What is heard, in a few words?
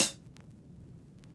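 Vinyl Closed Hat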